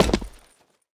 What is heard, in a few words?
land, player